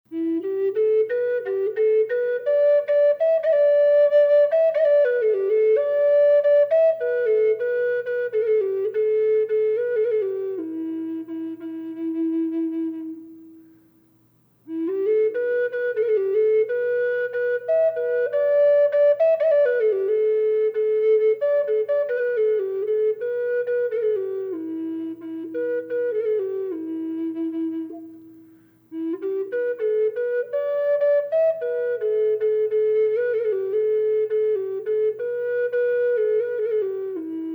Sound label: inuit granadillo reverb, flute